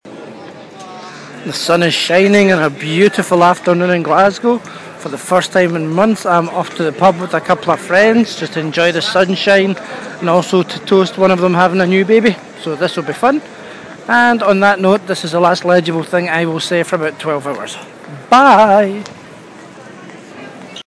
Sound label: glasgow saturday afternoon